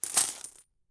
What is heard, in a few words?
coins